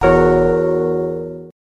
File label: piano